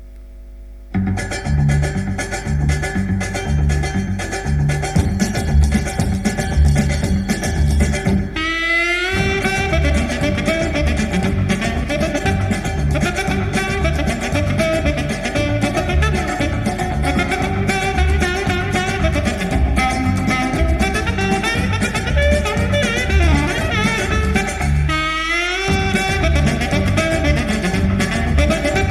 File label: Grundig 5005W